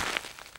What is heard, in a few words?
STEPS Gravel, Walk